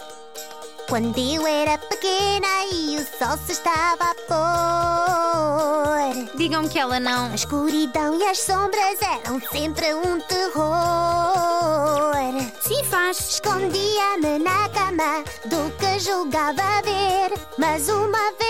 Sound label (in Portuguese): European Portuguese; Season 1